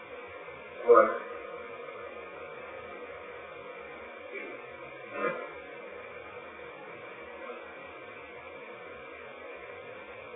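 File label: 2nd Floor Middle Room Spirit Box; wexford general store antique shop return investigation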